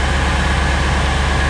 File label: Car Engine Run; Automobiles; 1001 Sound Effects